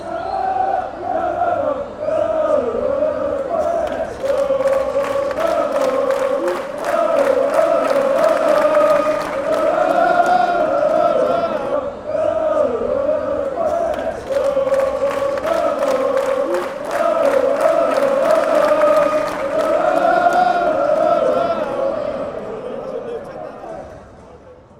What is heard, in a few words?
fanchants; dadada lokeren